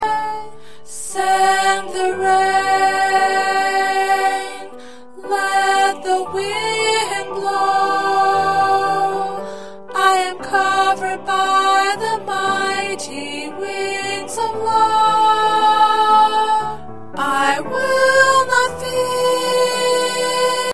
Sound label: Soprano